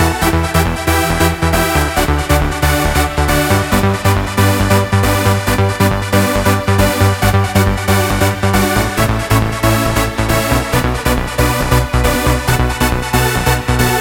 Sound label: Special Sounds Root E 137 BPM; vengeance essential club sounds-3